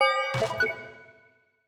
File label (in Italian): Enorme Cristallo; BLIND QUEST - The Enchanted Castle